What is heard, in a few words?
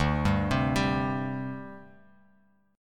D 7th Suspended 4th, chord; D7sus4 arpeggiated